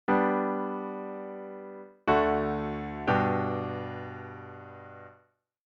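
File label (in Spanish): Cadencia Auténtica Perfecta V a I, G; auditivo de cadencias autenticas plagales rotas y semicadencias; Identifica la cadencia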